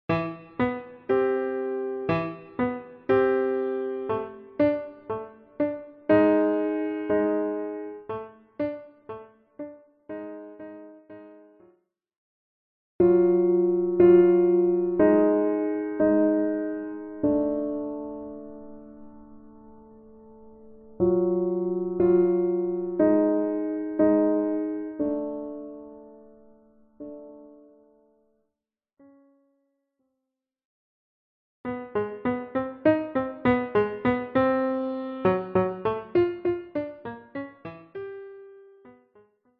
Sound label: fanfare